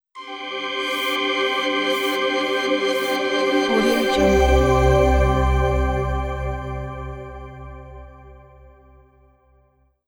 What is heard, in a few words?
fantastic clean logo